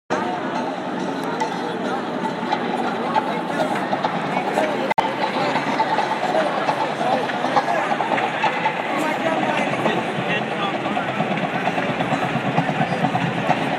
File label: drummers getting the crowd pumping; 49ers; Levi's Stadium